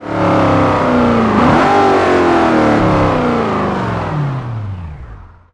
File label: throttle off, vehicles; cayenne